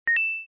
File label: coin